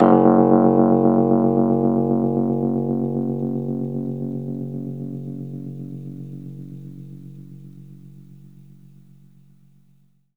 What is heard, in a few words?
RHODES F#, L; 3D Sound; 3D Keys VOL-1